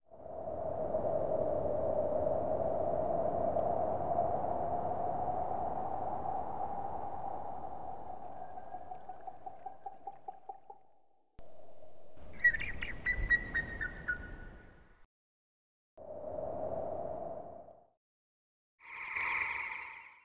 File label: Ambient